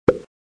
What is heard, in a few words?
fun button; Cars; tractor tip